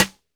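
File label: Snare one shot; Sonic Foundry (Sony Creative Software); Club Joints and Street Anthems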